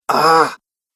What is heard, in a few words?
Male Voice Aghh; Recorded Voice Sound Effects